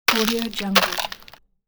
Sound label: ice cracking